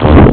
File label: fart